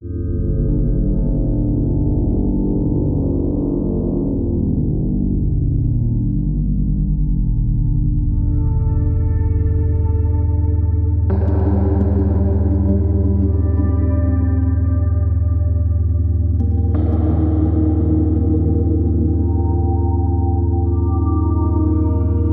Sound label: ambient music; Gear Gauntlet; Wii U